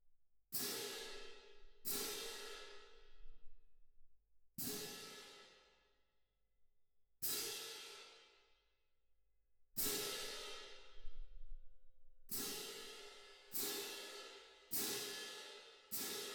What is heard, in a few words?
Twin R Perc